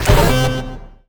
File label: Jingle - Pause Menu; Bionic Commando Rearmed